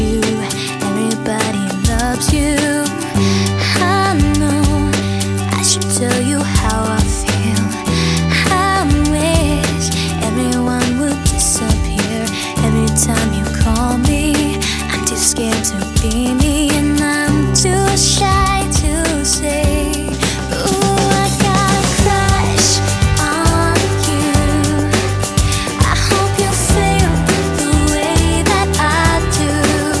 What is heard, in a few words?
pop